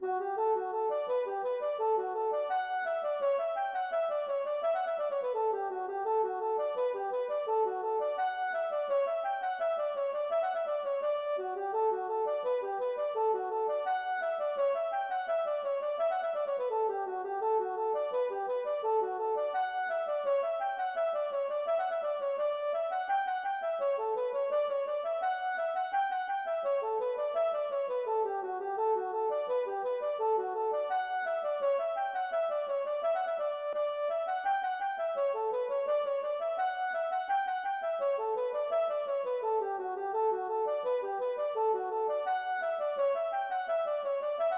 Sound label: hornpipe